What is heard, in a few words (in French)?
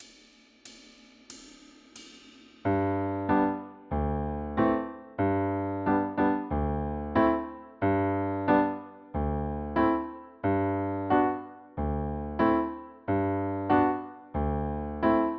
percu